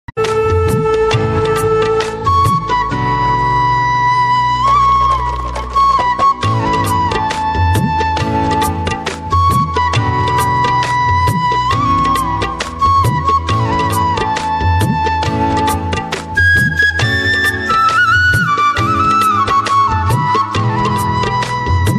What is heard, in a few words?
Flute Ringtone Best Flute Ringtone